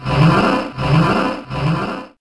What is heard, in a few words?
envirosuit fade